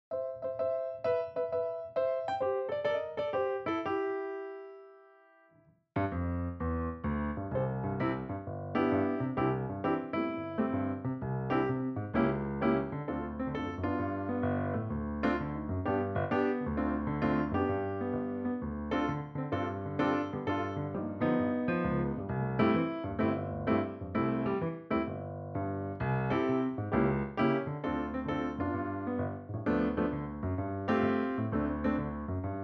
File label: piano